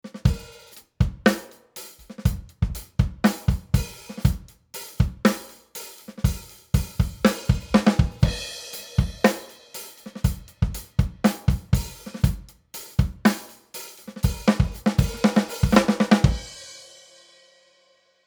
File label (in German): Summit Audio EQP 200B Drums ohne EQP 200B